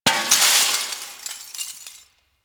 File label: Desktop Glass Destroy; Warframe; Ambience; Entrati